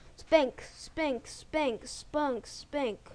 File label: spank